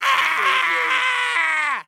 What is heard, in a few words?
Scream Male; sound effects bible